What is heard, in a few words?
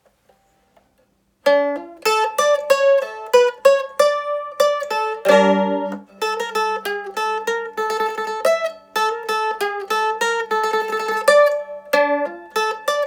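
bulgarian advanced, mandolin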